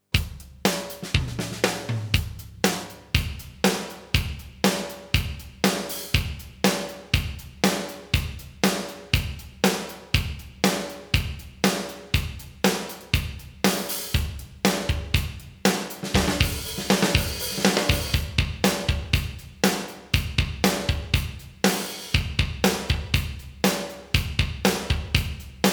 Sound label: Lexicon Modell200 Rich Plate Drums